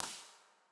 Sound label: Textures, Impulse Responses; 3 Seasons L; Ableton Live 12 Lite; Hybrid